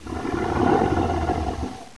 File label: gonome idle